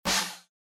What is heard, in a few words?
drum snare